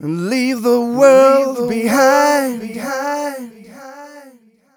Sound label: 130 mel g, Vocals